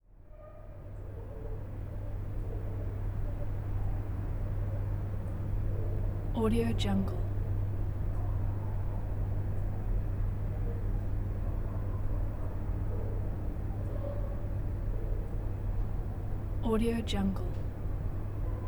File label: small city night ambience; west-wolf